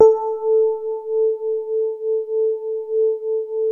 DX7 soft A4, e-piano; Music Store

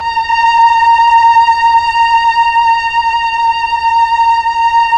STR, Melo. Violins, Slow Violins; Roland LCDP09 Keys of the 60s and 70s 1

